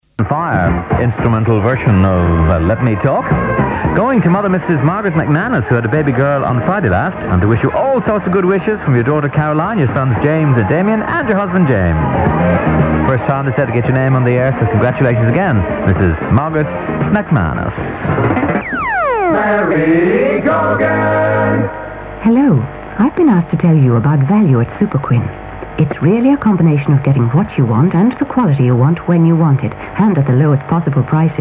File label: jingle; rte2 612am; larry gogan